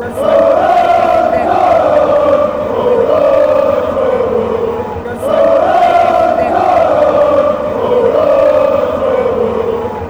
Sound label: chants; cologne